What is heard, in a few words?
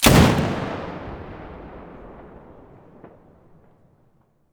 muzzle spas12 mono, weapons